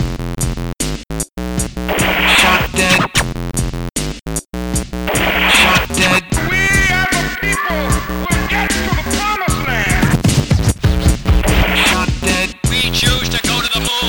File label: novocal